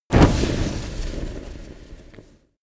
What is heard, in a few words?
campfire medium inflame